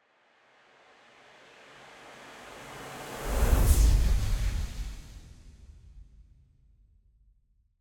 Whoosh, Sound Design, Whip By, Airy, Thick, Release to Choppy, Very Slow; Airborne Sound; Power Accents